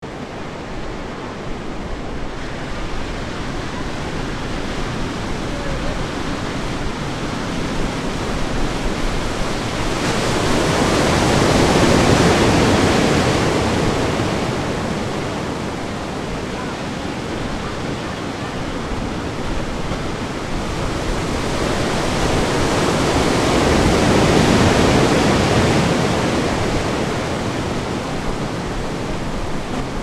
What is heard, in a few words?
harbor pier; san clemente